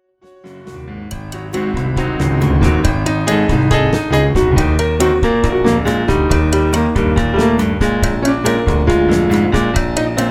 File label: Stomp